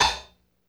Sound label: LOFI HAT; LO-FI KITS; Spectrasonics - Burning Grooves CD2